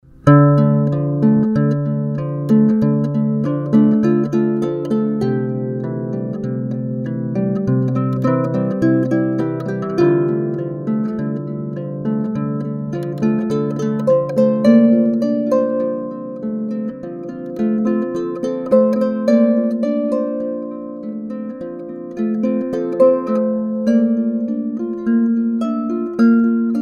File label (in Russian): zvuk tishyny arfa